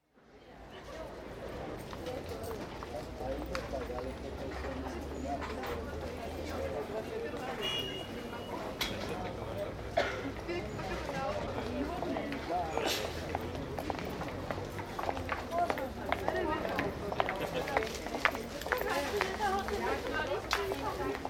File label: Market, Bratislava, speech (Slovak), distant traffic, some bangs, not very busy; City; BBC